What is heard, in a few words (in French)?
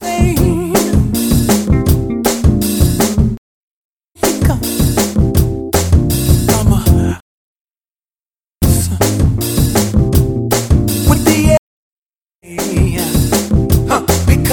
happy clavier